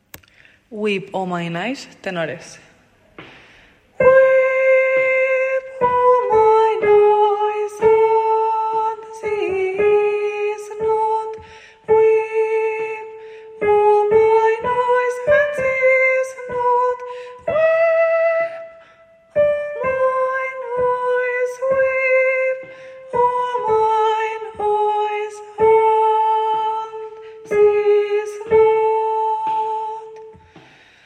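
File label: weep tenor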